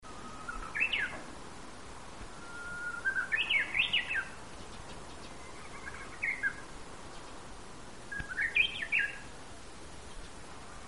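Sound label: uguisu, Japanese bush warbler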